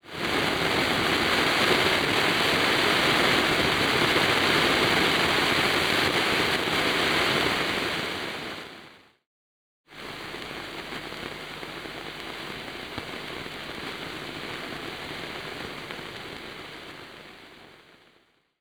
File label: noise; Green Mujina